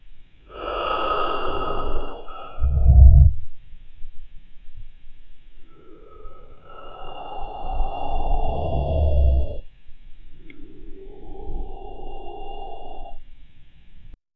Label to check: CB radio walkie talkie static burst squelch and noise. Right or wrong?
Wrong